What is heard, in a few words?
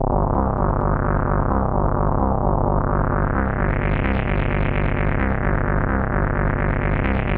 130 Cm synth, Synth Loops, Trance